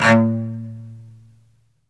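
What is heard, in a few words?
solo cello, strings; ILIO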